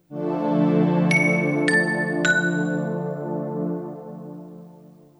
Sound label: Jingle; Dunkanoid